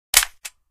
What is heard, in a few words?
mp5 clip in; weapons; mp5navy; source engine; left4dead2